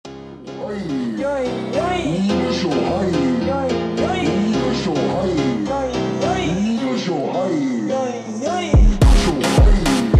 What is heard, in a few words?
slowed Reverb